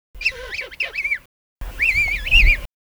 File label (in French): poussin royal